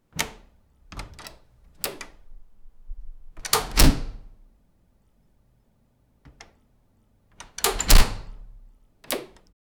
opening the airlock door, airlock

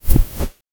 the sound of a synthetic

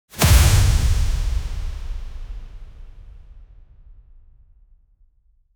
a sharp fast cinematic wh, whip

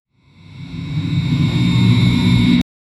horror dark fantasy

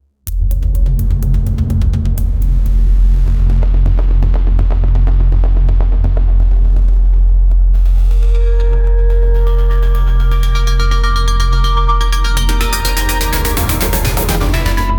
cinematic fast paced intr, intro

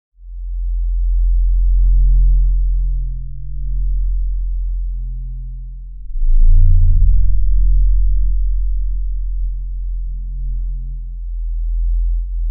deep rumble build up

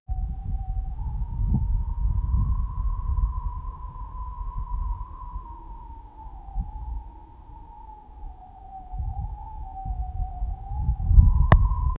a gentle asmr sound

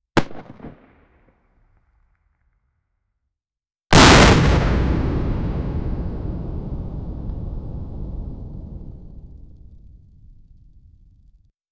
a tree falls on a

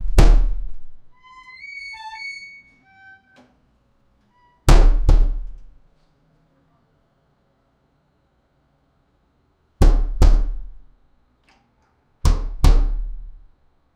door sounds in a block, building

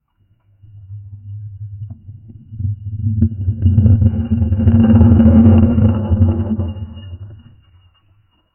the sound of a big, boulder

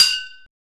a heavy medieval; props

